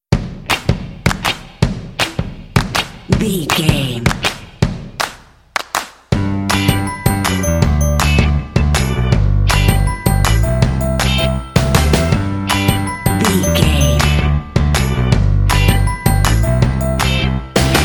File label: happy summer upbeat fun